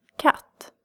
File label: cat